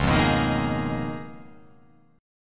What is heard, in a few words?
Db 13th Db down, Db13 chord; strummed; JGuitar